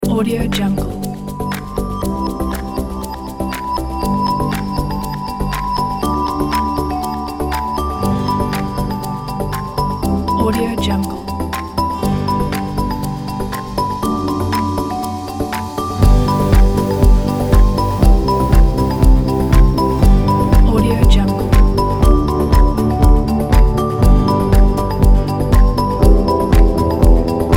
corporate uplifting motivation; paradisestudio

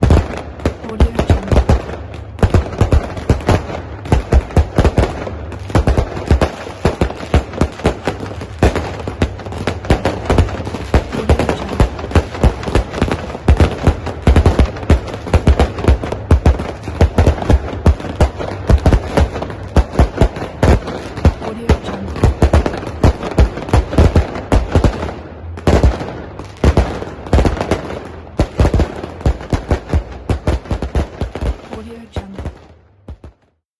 firework

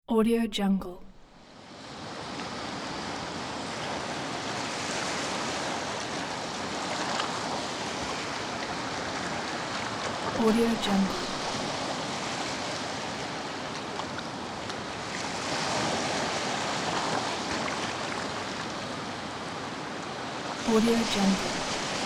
open ocean

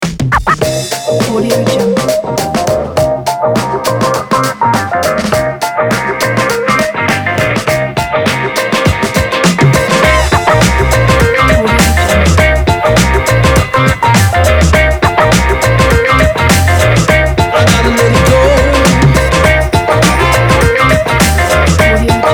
upbeat and funky beats pack

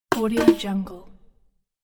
percussive score notification

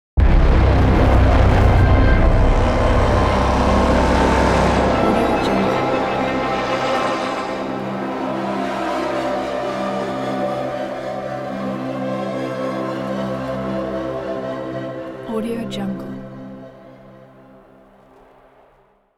cinematic trailer braam sfx

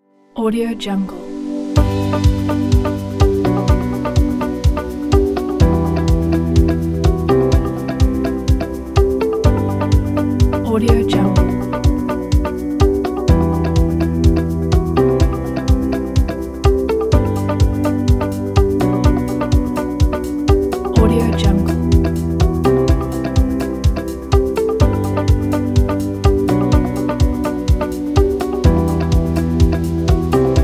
inspiring uplifting upbeat corporate

